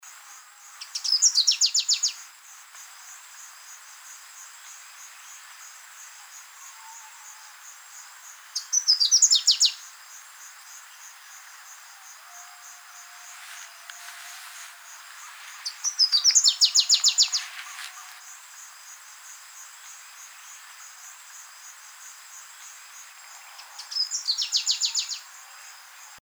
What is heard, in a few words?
Variable sunbird